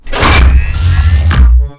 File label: gauss siege start; doom gauss